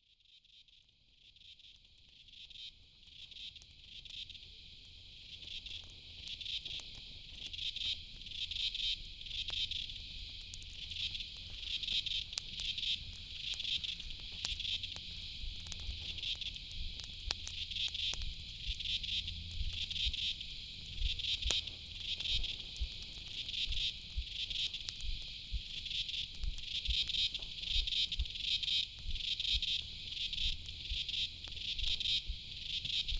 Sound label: soundscape; country